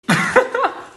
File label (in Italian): risata